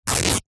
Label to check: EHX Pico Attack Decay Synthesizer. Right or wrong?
Wrong